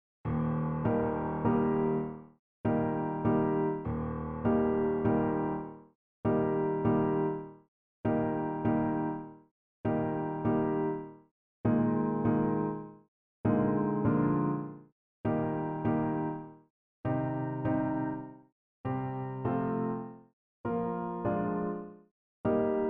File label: Piano Only; saint saens elephant